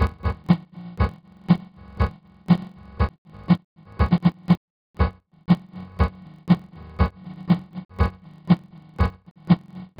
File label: midi drums; Sound Source Separation Using Sparse Coding